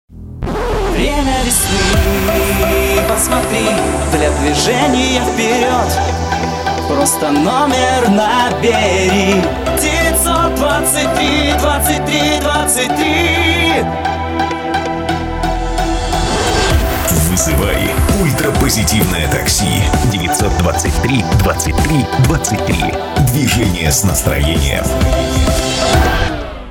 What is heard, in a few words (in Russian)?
vokal